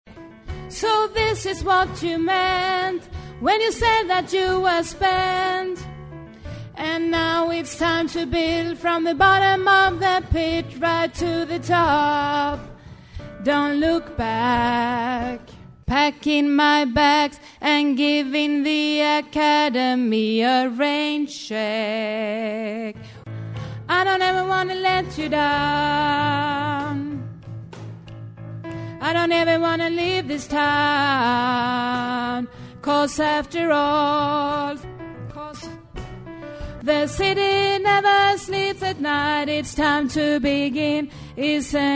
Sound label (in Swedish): alt, stammor